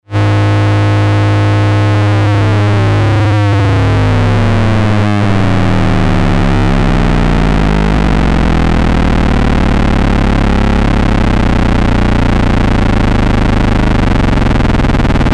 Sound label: LFO Modulation